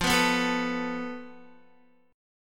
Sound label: F Major Flat 5th, Guitar; FMb5 strummed; JGuitar